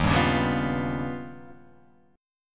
D sharp Augmented 9th D sharp

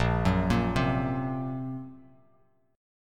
Bb Suspended 2nd Sharp 5th, Bass Standard; Bbsus2#5; arpeggiated; 6,9,8,5; JGuitar

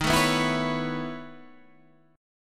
B 11th Eb, chord

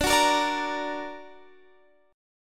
D Augmented D down Guitar Standard; D+ strummed; JGuitar

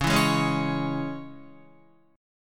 Db Minor, Guitar; strummed; 9,7,6,6,8,8; 4E,4A,5D,5G,5C,6F

